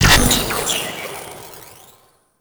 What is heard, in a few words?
Sentient Scan Burst; Warframe; DE; Sentient Scanning